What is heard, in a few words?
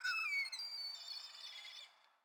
sting gulls; Rust